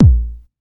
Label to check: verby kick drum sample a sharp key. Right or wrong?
Right